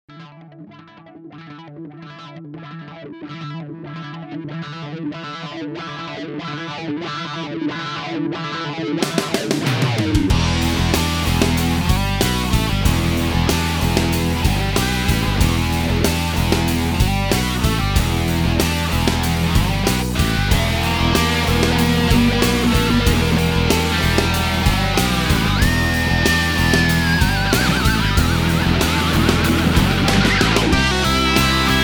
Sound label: Keeley Vox Wah